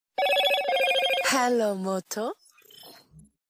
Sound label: hello moto sound notification